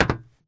Grunt Mvmt FS Walk Solid Metal; Titanfall 2

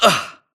grunt hits; Titanfall 2

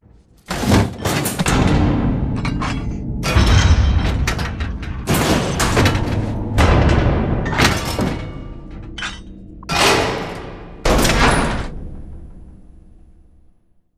dog whistle idle mech close; boneyard; Titanfall 2

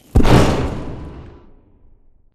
Large Concrete Wall Stop; Titanfall 2; Boomtown

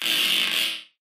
bullet energy titan shield spang cluster; Titanfall 2

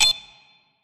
Match Start Timer Tick 5 Sec; HUD; Titanfall 2

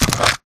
battery pickup bright; Titanfall 2

